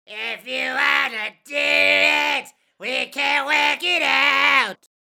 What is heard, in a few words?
growl; CVT